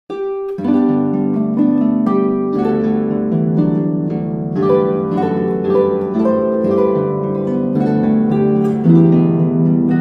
o come all ye faithful, christmas carols on the harp